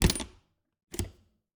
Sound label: Refridgerator, old fashioned, wooden, steel, brass clasps, hinges, close open, wide; 2 mics; Eiravaein Works; Vaeyan IV